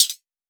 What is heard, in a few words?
hat2, hithat